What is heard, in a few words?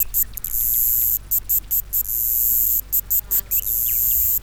cicada And Fly; desertSounds